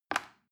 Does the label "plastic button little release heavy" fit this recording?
Yes